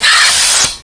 hypospray; SINDEMO; player; health

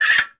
arrow quiver

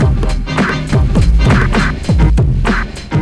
funk, bpm130

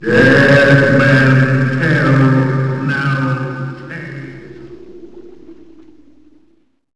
Exit Windows; Pirates

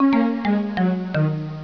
DOWNER, EFFECTS ELECTRON

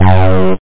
rave sound

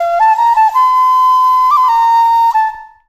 flute, ethno; eJay

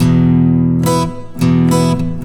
Uh Huh, Rock Guitars Acoustic Loops; ACID MUSIC

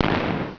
Seismic charge; Starbound II

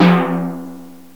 Timpani F; GMPlay; Drums; TG300B

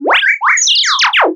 robot damage; Earth and Beyond; EA Games

